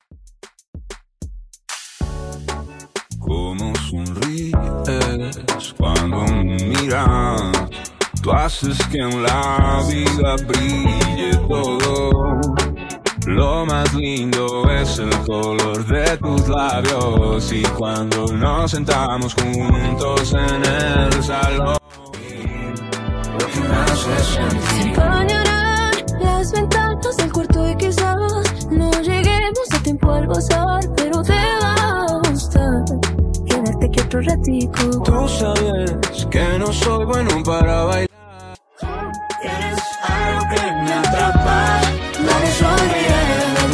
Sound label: Moombahton Redrum 95 bpm